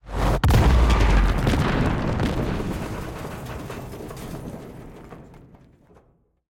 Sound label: chem explode